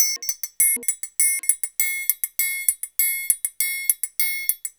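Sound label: TRIAN, triangle, Modern Percussion Loops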